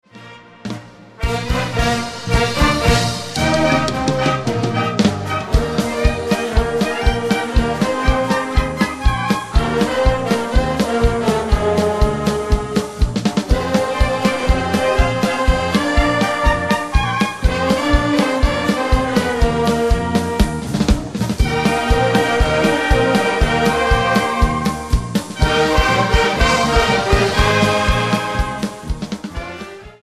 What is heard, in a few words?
Rosamunde, orkiestra